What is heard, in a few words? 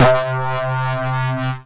Videogame, Bass, Percussive; Ableton; Creative Extensions